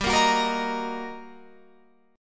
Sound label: G Minor Major 7th Sharp 5th, G, down, Guitar, Open F; GmM7#5; strummed